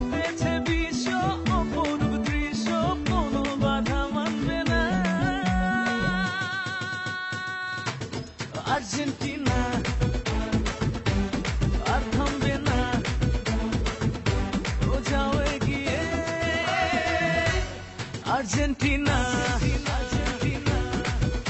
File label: bengli song ringtone